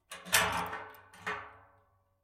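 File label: sounds of canister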